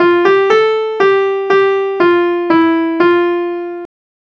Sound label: piano